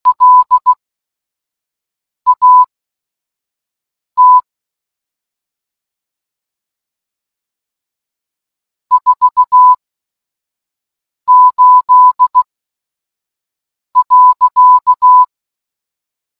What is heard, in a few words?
morse